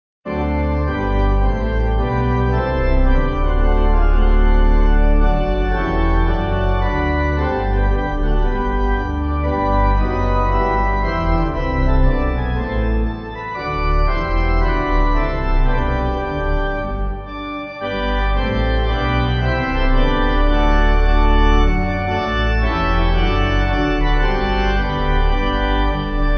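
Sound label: Pipe, Pipe Organ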